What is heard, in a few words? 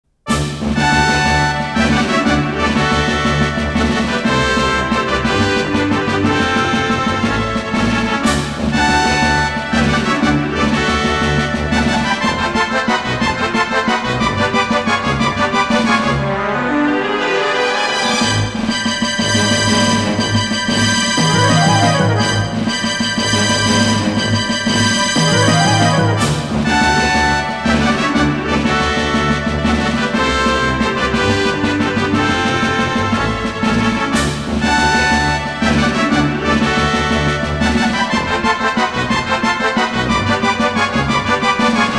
Exhibition, Synthesizer Version; Super Famicom Magazine Volume 12